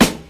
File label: 00s dry hip hop acoustic snare sample a key, Royality free snare sound tuned to the A note; snare-drum-samples